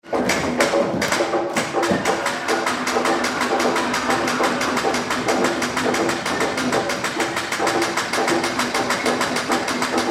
Kabuki Theater Drums Flute Sticks Applause Tokyo Japan; Japanese theater, Kabuki theater in Japa; Theater Sound Effects